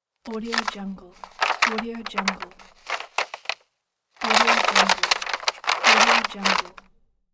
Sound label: newspaper rustling and turning pages